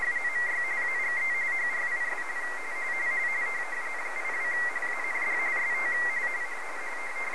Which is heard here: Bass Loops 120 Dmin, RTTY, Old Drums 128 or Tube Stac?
RTTY